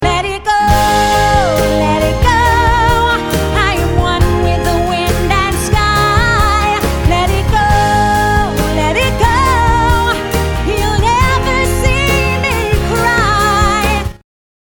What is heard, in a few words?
SATB